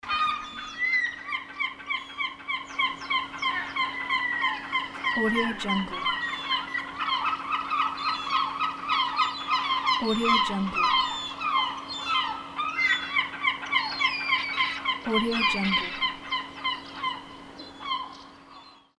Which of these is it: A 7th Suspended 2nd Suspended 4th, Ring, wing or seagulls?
seagulls